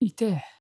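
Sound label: Itee, battlecries; いてぇ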